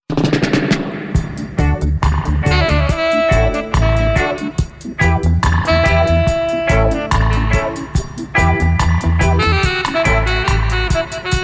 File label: Dub